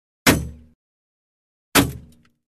Bullet Impacts Metal Bullet Impact With Light Debris; Hollywood Edge Foley Sound Library